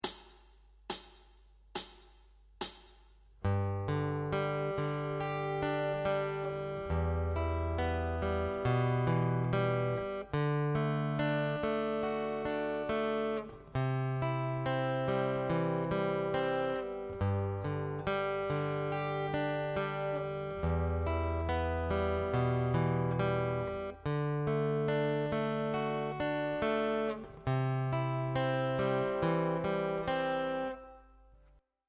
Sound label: Chord Pick Mix